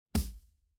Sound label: retro game hit